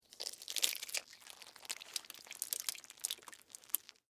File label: Tear Rip Slow Egg, Texture, Tear, Rip, Slow, Egg, Shell, Open, Vegetable; Ripping; BlastWave